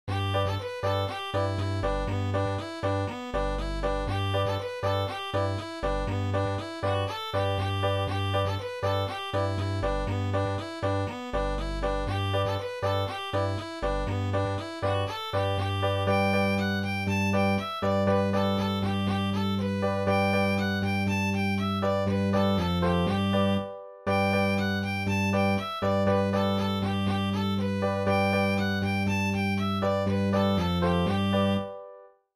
polka; john walshs

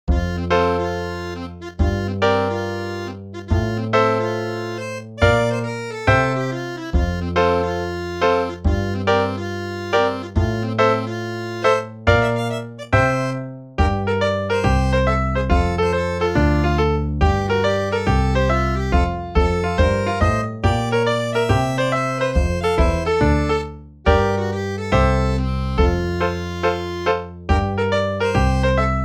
rock around the circle accordeon

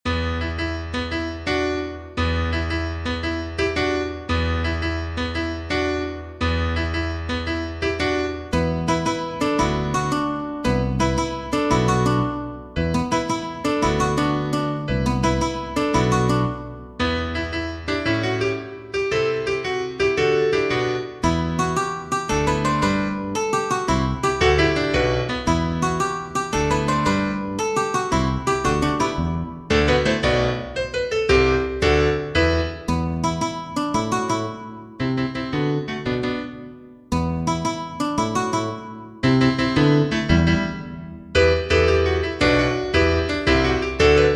rondeau descendant

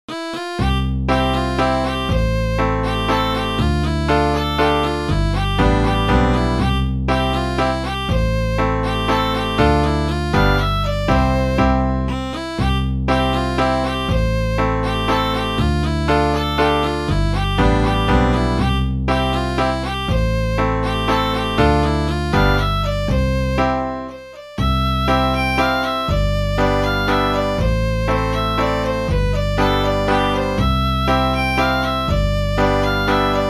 mazurka simple

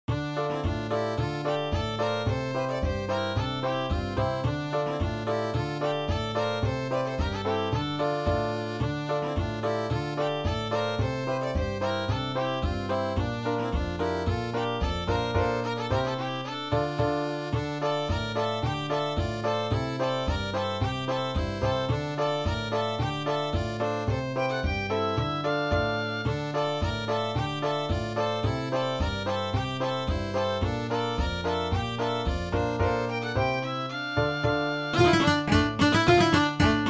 polka

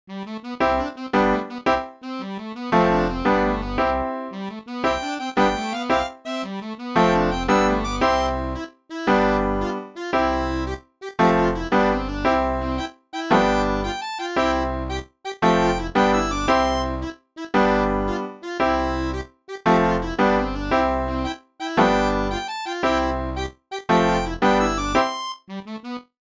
rondeau valse; rondeau gabriel

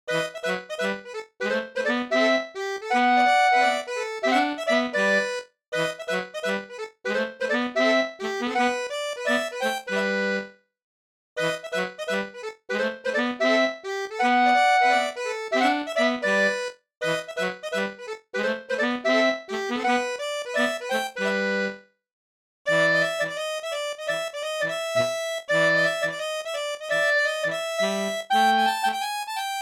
two step rock

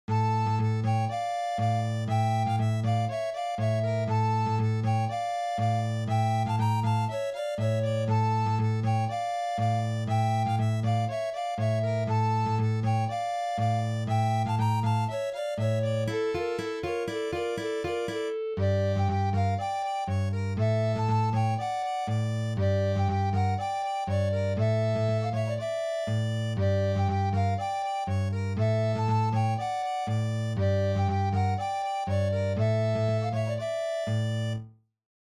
tzigane trio

